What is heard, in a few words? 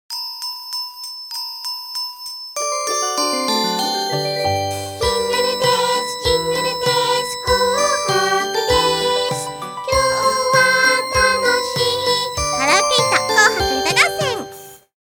jingle